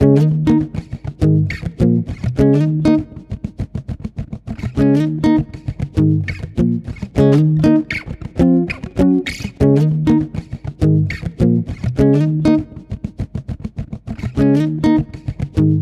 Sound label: Guitar 100 BPM (Ebmaj), Guitar Loops; Dare; Ghosthack; Guitar Loops & Shots